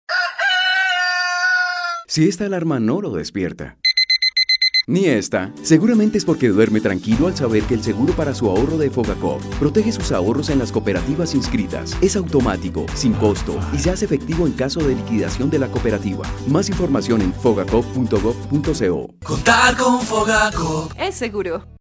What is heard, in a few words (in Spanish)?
ALARMA; FOGACOOP